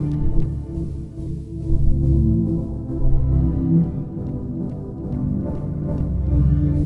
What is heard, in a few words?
Bpm140 Dukha Synth Loop Am, Dubstep